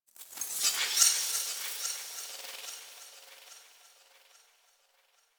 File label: Ship Metal Movement Texture Small; Ambience; Warframe; Corpus Ship Remaster